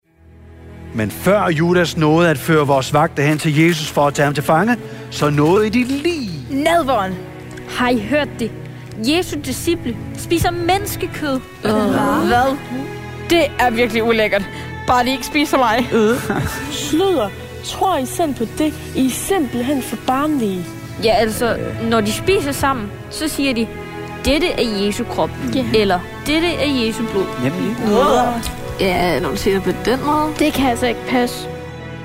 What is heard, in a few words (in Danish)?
Drama musik og drama